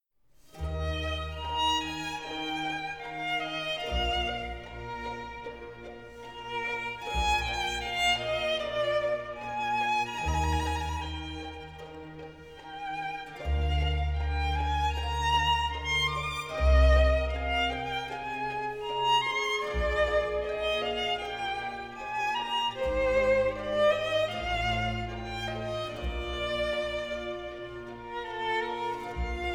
Chamber Orchestra Violin Concerto in F Minor Winter